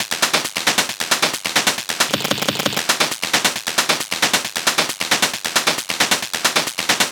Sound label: Perc Loop 135, Techno